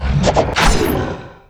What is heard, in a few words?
Attack